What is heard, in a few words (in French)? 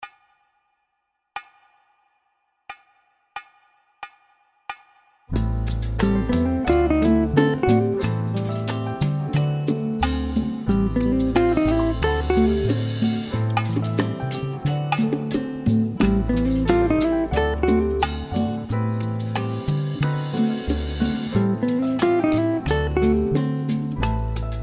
phrase cadence ii v7, guitare jazz